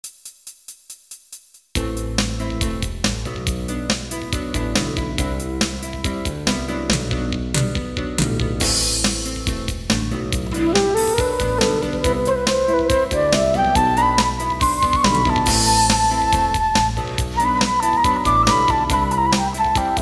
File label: A minor Jam